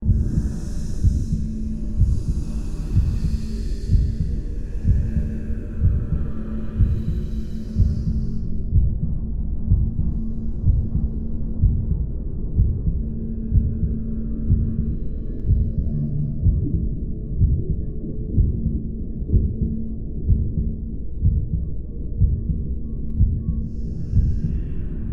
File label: Inky ambience